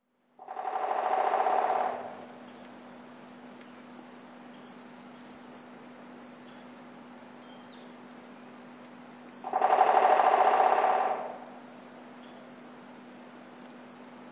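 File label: Great Spotted Woodpecker; akagera; mitake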